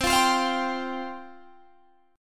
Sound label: C Suspended 4th C Guitar Drop D